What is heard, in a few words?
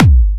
Kick 139BPM, Drums & Perc; G#minor